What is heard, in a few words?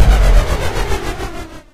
fly away; eiffelmedia; brick breaker